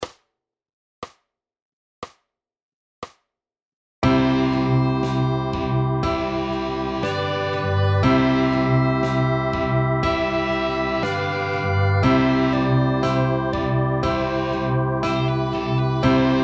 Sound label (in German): klavier